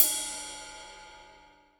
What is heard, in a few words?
Ride, Cymbals